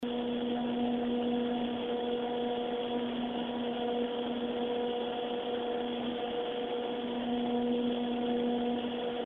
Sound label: glacialtech pwm, hi